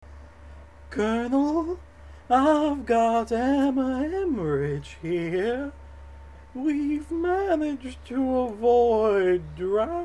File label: Drowning